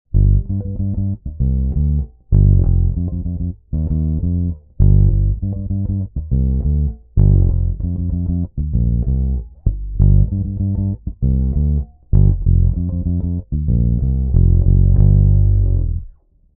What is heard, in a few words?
Neck Bassboost Passive Rolloff